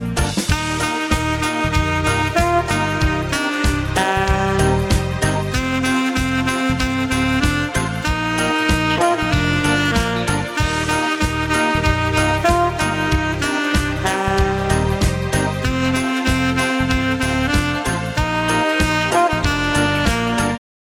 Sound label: alto; Простых мелодий для саксофониста